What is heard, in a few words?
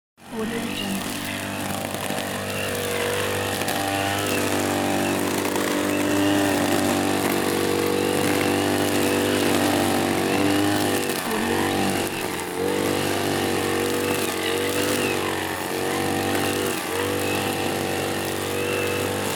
lawnmower